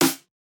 rockin snare

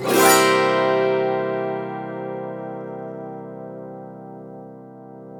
AUTO HARP, Auto Harp Min 7

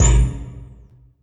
Metals Oneshot; Sonic Foundry (Sony Creative Software); Esoterik Beatz

